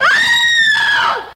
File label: scream